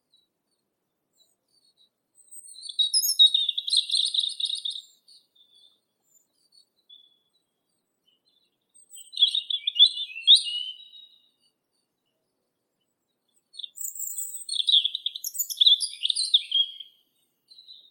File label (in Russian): zaryanka malinovka erithacus rubecula